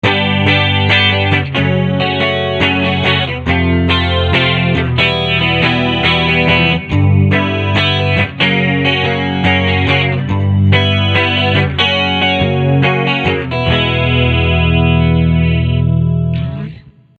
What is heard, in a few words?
Vypyr 100 Twin